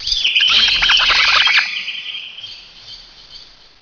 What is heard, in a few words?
rats